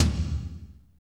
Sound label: TOM, Power Ballad